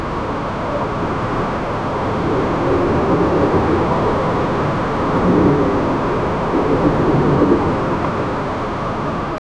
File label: loopable ambient storm, dystopian